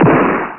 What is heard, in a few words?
Explosio; Strike Commander